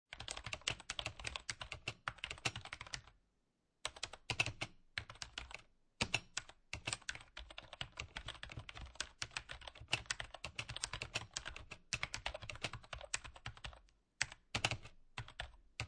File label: Office, Computer Keyboard, Typing Sequence, Medium Fast